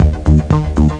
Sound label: bar, Music; Swoop